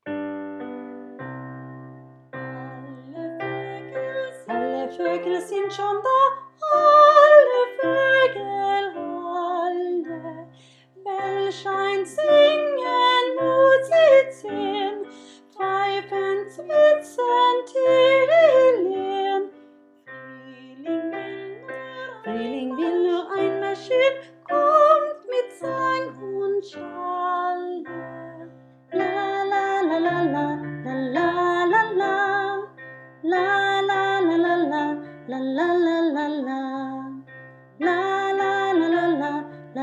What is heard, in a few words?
Descant; Alle Vogel sind schon da